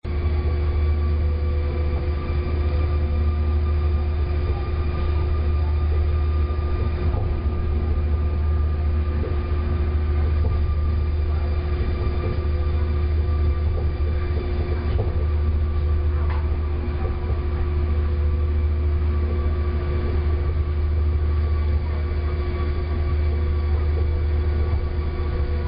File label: monorail; japan